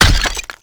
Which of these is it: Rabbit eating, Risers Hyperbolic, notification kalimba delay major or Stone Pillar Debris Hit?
Stone Pillar Debris Hit